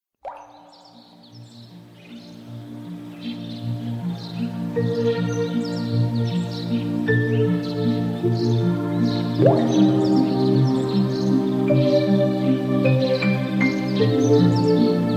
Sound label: fine alarm ringtone; samsung